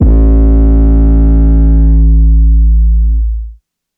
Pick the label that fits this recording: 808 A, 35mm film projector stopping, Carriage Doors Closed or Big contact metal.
808 A